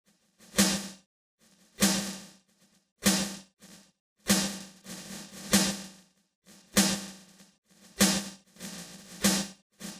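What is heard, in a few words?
Polyfonic signal separated; Separation of Sound Sources by Convolutive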